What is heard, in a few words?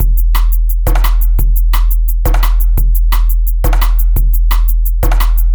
DRMLP, Drum Loops; neuro; Stanza